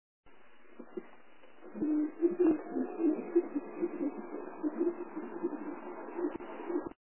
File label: squeaky wiggle sounds like owls coming front right corner car wheel noise; e-class w211